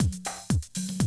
120, Classic Drum Loops

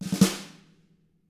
RUFF, snare rolls; ILIO; Double Platinum Drums 1

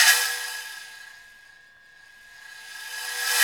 RECO, RecoReco; Club-50 - Foundations Roland; ExotcMetal

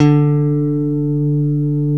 ALEMBIC BASS; OMI - Universe of Sounds

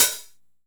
909 OPENHAT

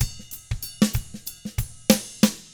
95 funk, drums; ILIO; Double Platinum Drums 1

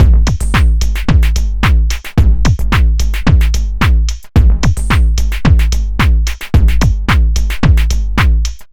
groove boy 110bpm

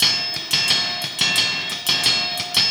Cymbol Particle; Particles

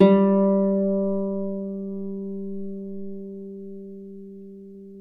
Celtic Harp; E-MU Producer Series Vol. 7 – Old World Instruments

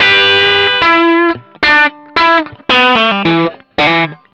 BLUE RIF, Vintage Blues Guitar; USB Soundscan vol.22; AKAI

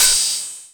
Open HH, Cymbals; Vengeance; Essential Clubsounds Vol.4; Open Hihats

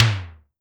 SIMMONS SD-5 drums; SDS5

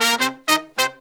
96BPM, Horns; 90 s Sample CDs; Zero-G; Phantom Horns

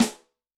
RIM etal, Yamaha DTXpress-II snare

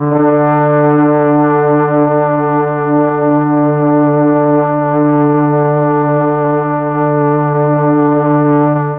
D3 BRASS, Mellotron; HALFSP.BRASS; Mike Pinder - Mellotron Archive CD

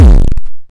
Star Instruments Synare PS-1

